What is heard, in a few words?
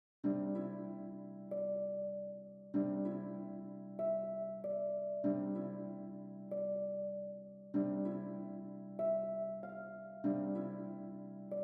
Harp